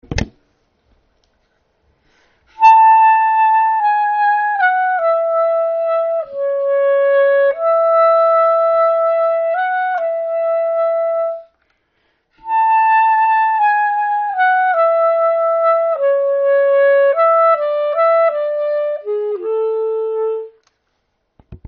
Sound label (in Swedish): Enbart blåsstämma